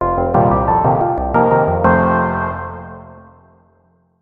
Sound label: jingle